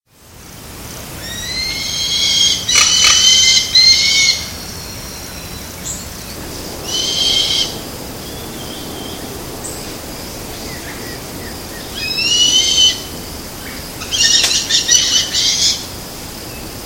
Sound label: Caracara plancus, Carancho meridional